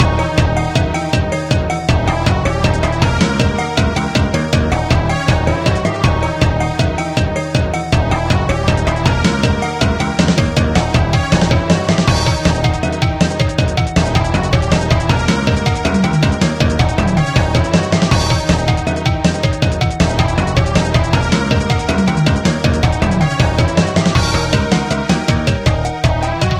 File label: Boss Slime Loop; Dizzy Knight; Android